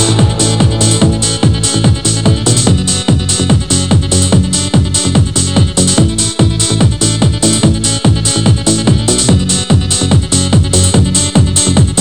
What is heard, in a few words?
BARMUSIC; DUKE RUS